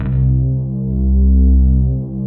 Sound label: PLUCK PDS; Pro-Rec - Synthsonic; TR PADS